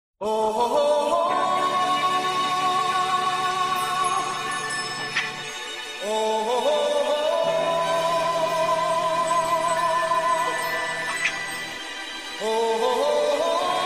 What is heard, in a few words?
ohohohooo